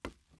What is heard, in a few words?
wood step